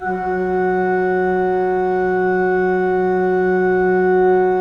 3D Pipe Mix Celeste, 3D Organs

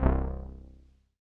505, String Machines

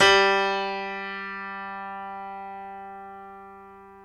PNO, Grand Pno, Roland; Club-50 - Foundations Roland; YPno 1 S

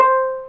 Viol Piz C4, PizzStr; Fairlight CMI IIe

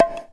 BONGO; InVision Interactive; Percussion Slam

